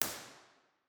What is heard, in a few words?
bformat IR, holy trinity church; Position1; OPENAIR